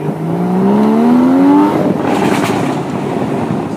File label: 981 sports exhaust overrun no pops or burbles; boxster and boxster s 986; 981 my car 2nd gear; problems